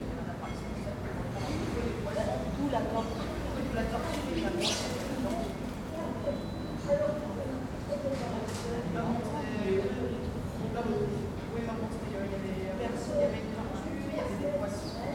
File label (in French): cour